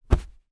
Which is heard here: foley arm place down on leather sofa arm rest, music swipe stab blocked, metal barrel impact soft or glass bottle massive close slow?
foley arm place down on leather sofa arm rest